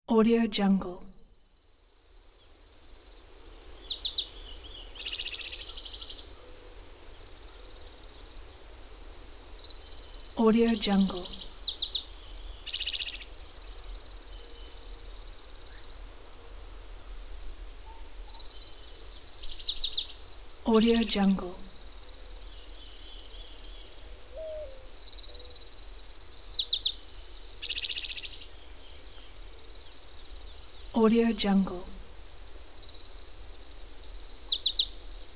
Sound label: peaceful forest